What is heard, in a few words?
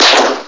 gunsho; Nordlicht Spiele 20-01